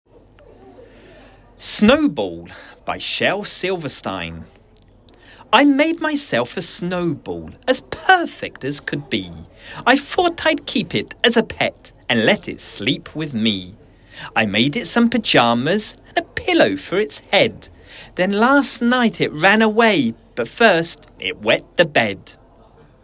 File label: Girls Solo Verse